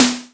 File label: SD-Analog, Korg Radias